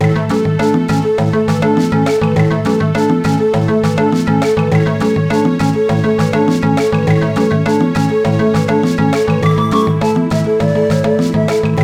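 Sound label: background music level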